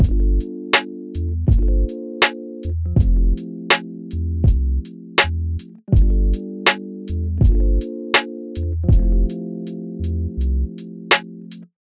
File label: rap mix; o-clip 2.0